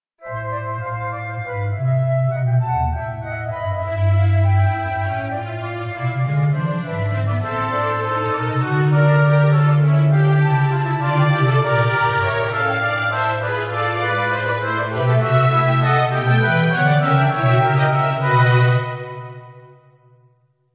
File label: Instrumental